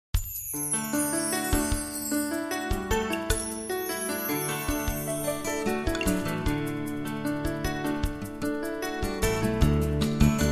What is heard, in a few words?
bgm